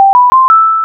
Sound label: Win